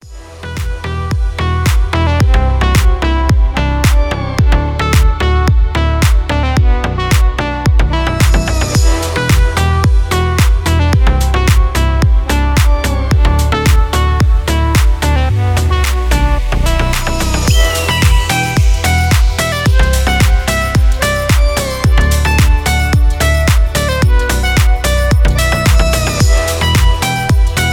bass

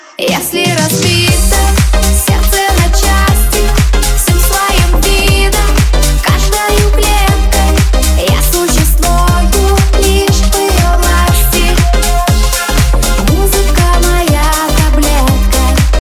deep house mix

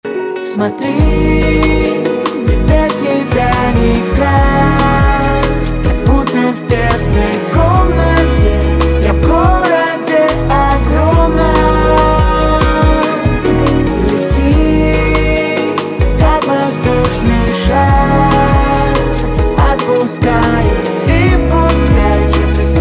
lounge version

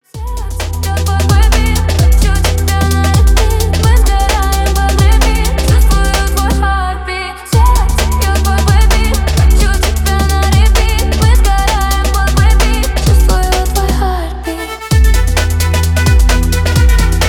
breakbeat

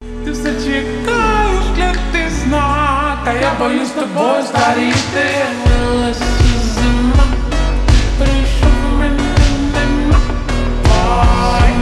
slowed reverb

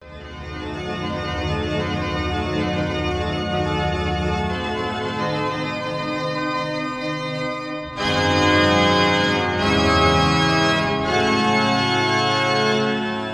Toccata and Fugue in d-moll, Toccata; Johann Sebastian Bach; BWV 565

